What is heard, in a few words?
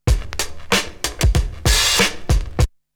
Drumloop; ZERO G - Datafile DISC 2